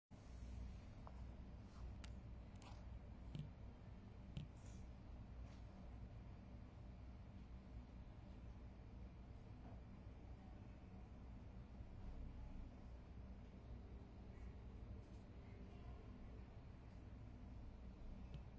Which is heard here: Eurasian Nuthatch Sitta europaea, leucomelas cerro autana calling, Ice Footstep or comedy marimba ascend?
leucomelas cerro autana calling